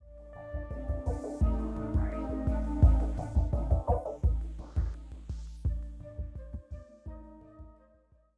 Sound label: 85bpm; Legends of Pegasus; windows gamerip 2012